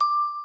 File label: tink